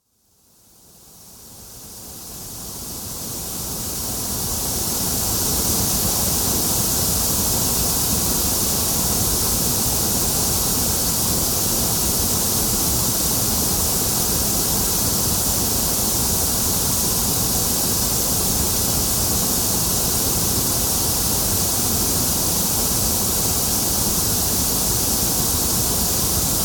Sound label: white noise